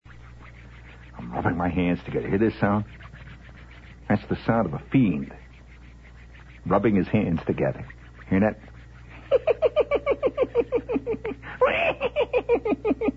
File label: Requiem for Soprano